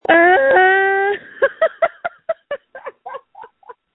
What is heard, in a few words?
Scream